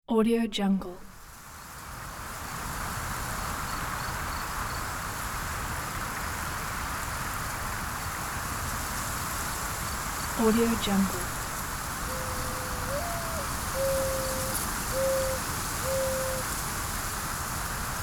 windy forest

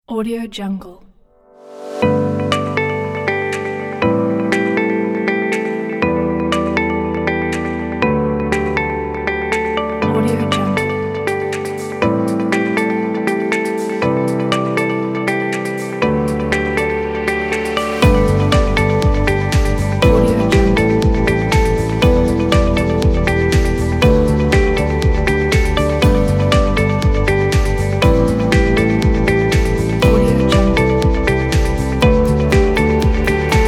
inspiring upbeat innovation kit; skosma

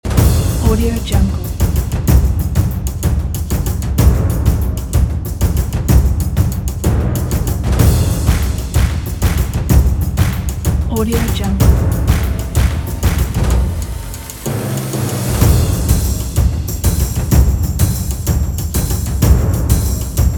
percussion

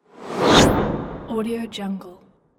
tutorial swoosh

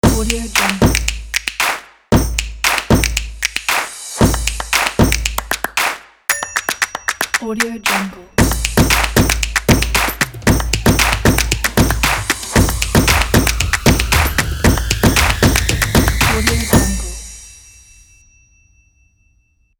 drums logo

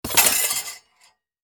glass shards garbage throw out

